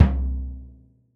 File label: bassdrum oh, gretsch kit